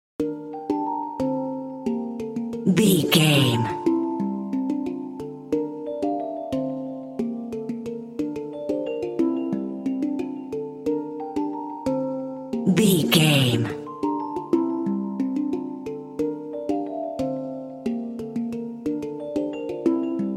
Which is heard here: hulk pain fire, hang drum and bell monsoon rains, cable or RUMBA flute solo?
hang drum and bell monsoon rains